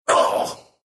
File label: pain, ru; Dota 2